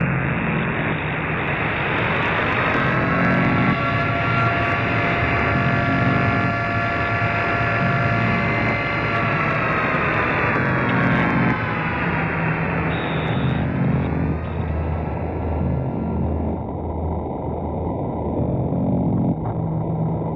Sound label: strange noise and siren